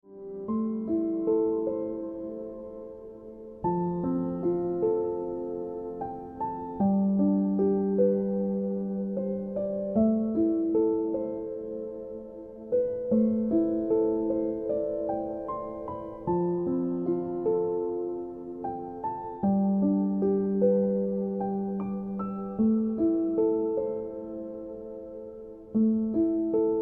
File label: Ringtone Piano Music